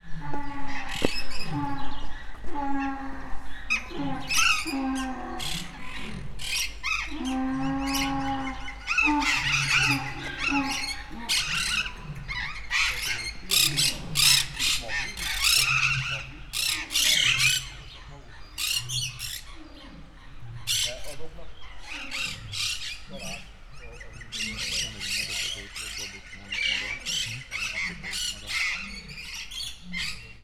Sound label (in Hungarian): szamár, oroszlán, sziklapapagáj; debreceni zoo 2012